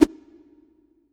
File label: STAIR, AIRSTICK WET; Q Up Arts; Denny Jaeger Private Collection